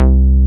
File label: MIXMOOG, Synth Bass; BA1 Synth-Basses; Sound & Vision - Gigapack I CD 2